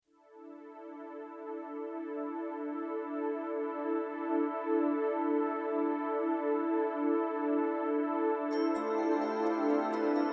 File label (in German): Glocken